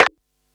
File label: snare; dough-samples; eddyflux